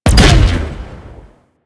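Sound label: Space Fighter Projectile Explosion; Space Fighter Gox Agent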